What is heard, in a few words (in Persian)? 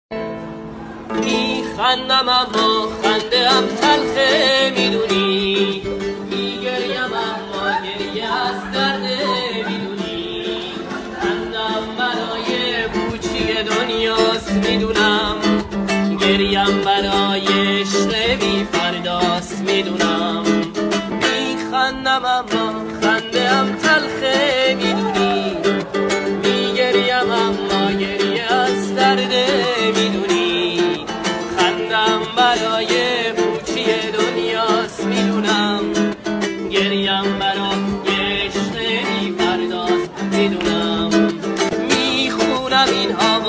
ba gitar